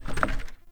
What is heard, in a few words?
Wood Stool Drag Scrape; Objects